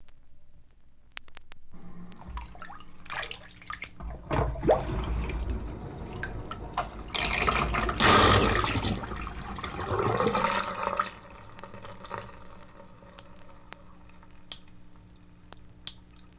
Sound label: Sink Draining